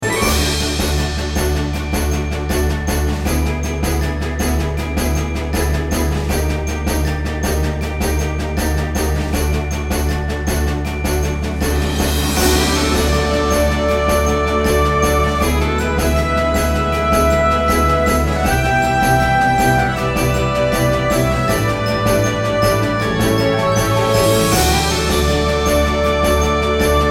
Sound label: Arcade Menu; Magical Drop VI; Windows